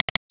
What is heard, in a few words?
click